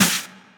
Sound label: Snare Wet High